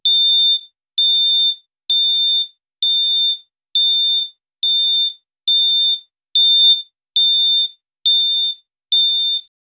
a series of sharp high pitched beeps from a digital device